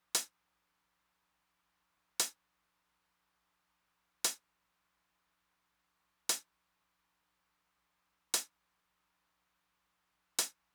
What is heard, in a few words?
closed hat, Roland TR-909; drum machine; Roland TR-909 Ableton Project